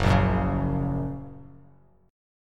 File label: F sharp Major, Bass Standard; strummed; JGuitar